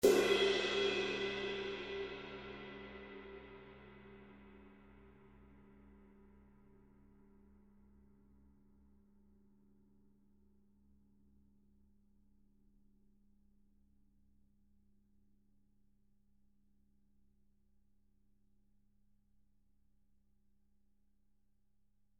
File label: zildjian